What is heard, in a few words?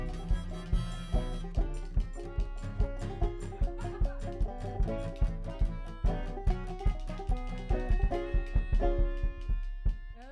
Chordz